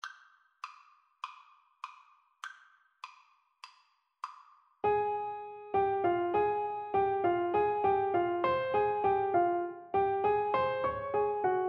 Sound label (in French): quatre mains, Piano Duet